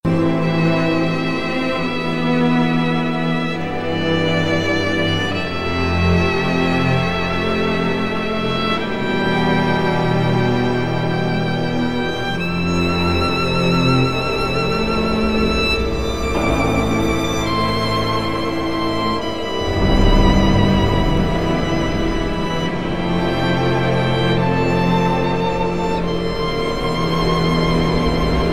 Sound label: Busted Sub